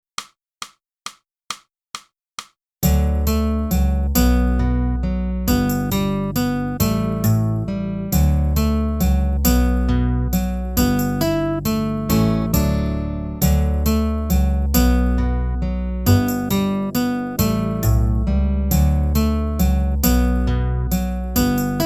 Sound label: flute feeling the rhythm